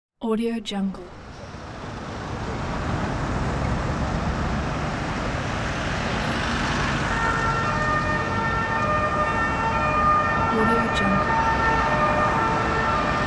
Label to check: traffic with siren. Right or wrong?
Right